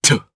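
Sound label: Vox Landing, Japanese; DarkKasel; kingsraid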